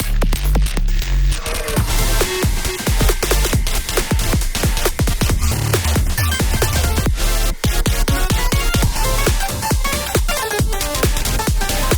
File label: one chord progression